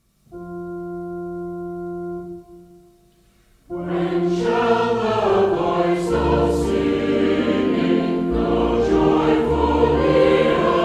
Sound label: when shall the voice of singing